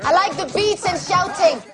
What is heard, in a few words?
beat shouting; I like the beat and shouting!